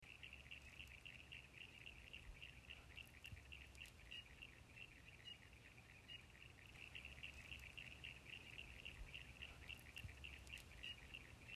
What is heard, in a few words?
Sound outside